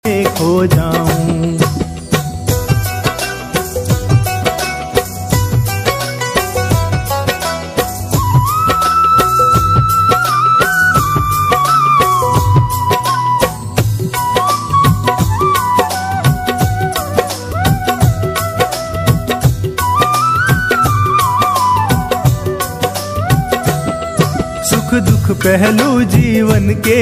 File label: Khatu Shyam Bhajan